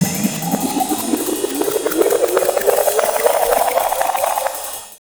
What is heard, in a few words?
Kaos FX, Ambient Grooves